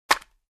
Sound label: Empty plastic bottle smack